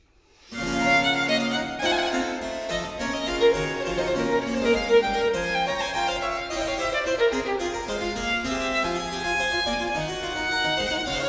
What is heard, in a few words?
Sonata No. 1 in B Minor, Allegro, Violin and Harpsichord; BWV 1014; Bach; J.S. Bach